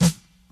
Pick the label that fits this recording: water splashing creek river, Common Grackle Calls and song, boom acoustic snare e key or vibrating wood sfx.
boom acoustic snare e key